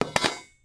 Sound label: pipe drop wood; objects; Star Wars Obi-Wan; XBOX